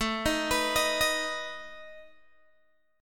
A Suspended 2nd Flat 5th, Guitar, Open G

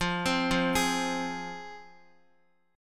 F Minor, down, Guitar; arpeggiated; JGuitar

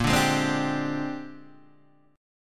Bb 13th, Guitar, Standard; Bb13 strummed

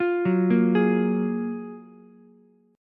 F sharp Major 9th, Ukulele, C6; F#M9 arpeggiated

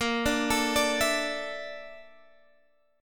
A sharp Major Sharp 11th, Guitar, Standard; arpeggiated; JGuitar

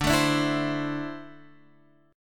D 7th Suspended 2nd down Guitar Half Step Down

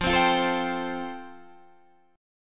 F Major Add 9th, Guitar Open D

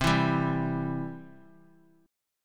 C Major; strummed; JGuitar

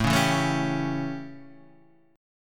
A 7th, chord; strummed; JGuitar

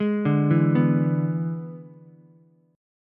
E 7th E down Ukulele; E7 arpeggiated; JGuitar

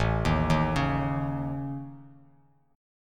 A Major Flat 5th, Bass Standard; arpeggiated

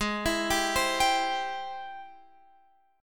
G sharp Augmented Major 7th, Guitar, Open F; arpeggiated; x,15,16,14,15,14; JGuitar

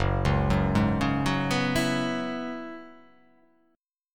Gb 7th Suspended 2nd Suspended 4th Gb down; arpeggiated; JGuitar

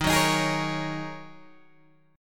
D sharp Minor 11th D sharp Guitar Open G, chord; chordshape; 13,11,13,13,11,11

